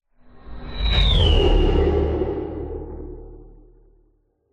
science fiction craft pass by robotic mechanical futuristic